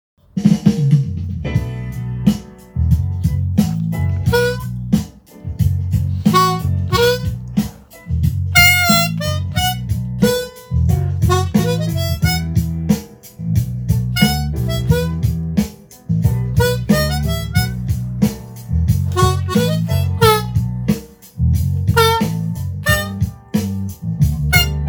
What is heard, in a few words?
acoustic